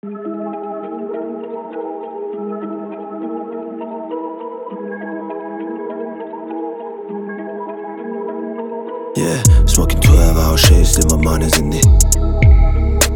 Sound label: beat